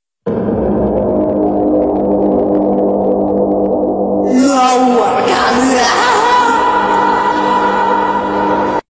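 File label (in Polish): na organy, on organ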